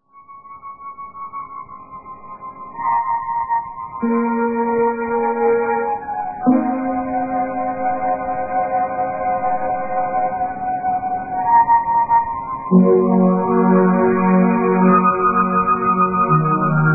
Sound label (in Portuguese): teclado lento